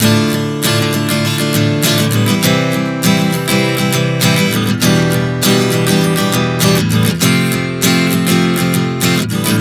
Dm7-C-Em7-F 100 bpm, acoustic guitar chord progressions